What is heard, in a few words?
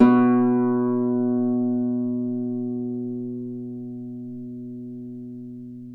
Celtic Harp, Plucked Strings